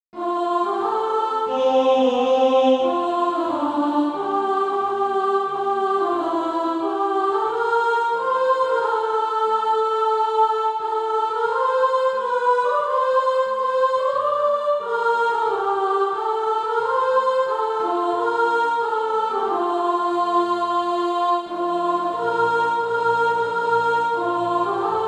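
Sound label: soprano, SATB Practise Music; Doing His Will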